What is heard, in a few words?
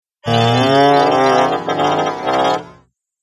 Robot Bending Unit; Robot Sound Effects; Sci-Fi Robot Sfx; Andrew Fleming